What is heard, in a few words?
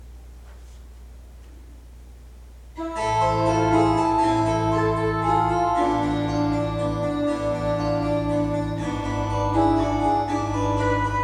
Siciliano